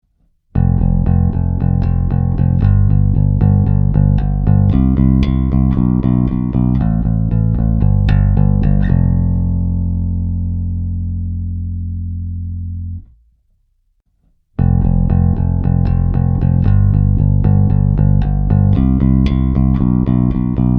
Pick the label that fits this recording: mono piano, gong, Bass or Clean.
Bass